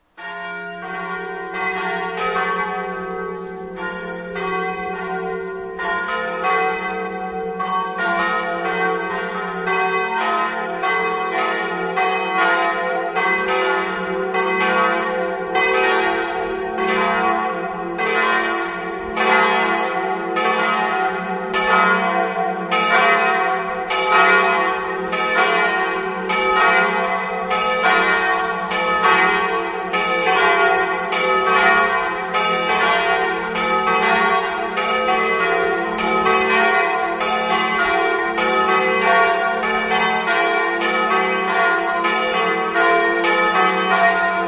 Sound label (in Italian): pravis, campane